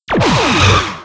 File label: Photon Torpedo, SciFi; PFx Brick